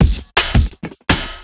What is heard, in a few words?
Classic Beat, Drum N Bass; Sonic Foundry (Sony Creative Software); Knutrix Drum & Bass